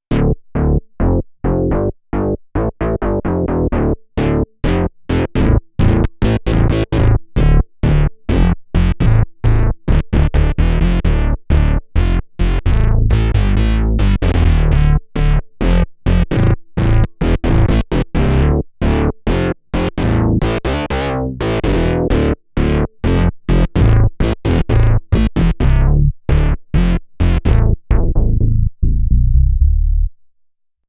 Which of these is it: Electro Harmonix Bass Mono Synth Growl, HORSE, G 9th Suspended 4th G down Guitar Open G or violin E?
Electro Harmonix Bass Mono Synth Growl